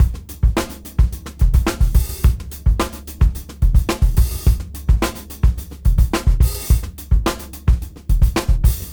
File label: Junky Funk 107 BPM; AMG - Keith LeBlanc Phat Butt Jams